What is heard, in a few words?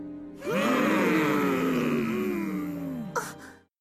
hmmmm